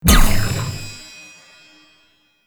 Knight Wave Ability Cast; Warframe; Duviri; Dax Knight; Abilities

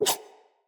Dagger Whoosh Heavy; Sinecure Dagger

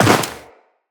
Walk Snow, Footsteps; Tenno

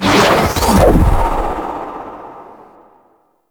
Aftertouch Engage Start; Warframe; Ranger

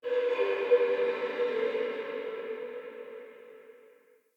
Under City Alley Eerie Metal; Ambience

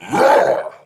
Punch, Barks; Kuva Lich; Persona A; Ability

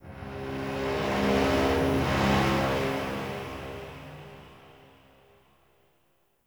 Metal Moan Texture Large, Corpus Ship ambience; Textures

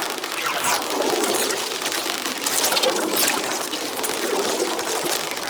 Caster Projectile Loop; Enemy; Abilities; Characters; Mendicant; Mnd King

